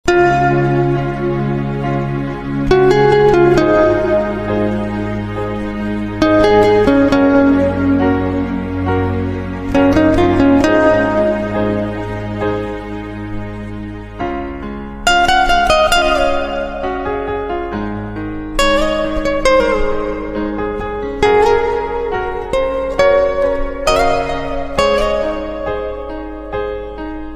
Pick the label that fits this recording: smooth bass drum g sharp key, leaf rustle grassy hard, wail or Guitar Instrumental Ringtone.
Guitar Instrumental Ringtone